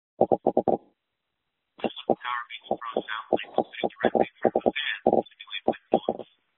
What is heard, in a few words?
wind; powerbeats